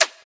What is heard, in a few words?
Fx Vox; Equipped Music; Loungin House